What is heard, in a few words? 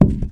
concrete impact soft; physics; Counter-Strike Global Offensive